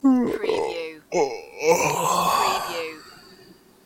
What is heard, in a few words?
Yawning, Male Yawn